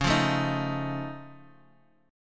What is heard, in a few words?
F Augmented 7th C sharp, chord